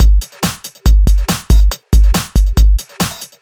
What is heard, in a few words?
Loops BB 140, BreakBeats; Vengeance Samples